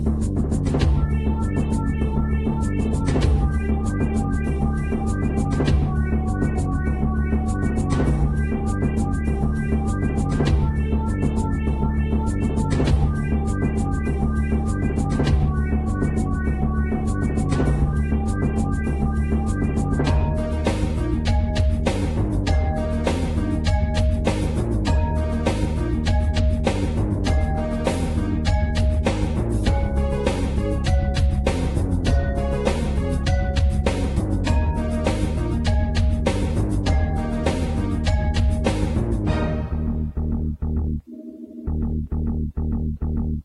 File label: wah